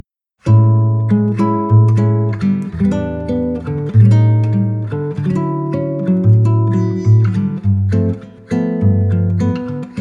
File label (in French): kremona, guitares; 7 cordes Kremona; Bossa Nova